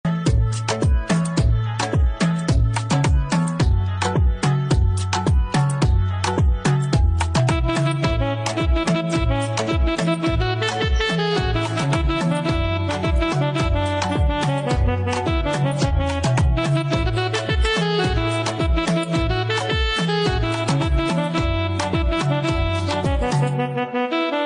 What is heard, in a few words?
instrumental ringtone